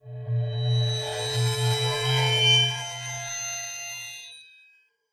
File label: spooky